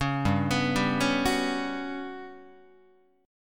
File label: G Major 7th Flat 5th, Guitar; Listen to GM7b5 arpeggiated; GM7b5 Chord